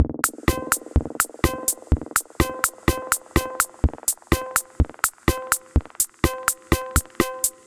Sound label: D55 125 Beat, Drum Machines; Samurai; Ableton